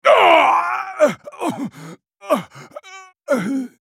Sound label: dying; Garen; Character Voices - English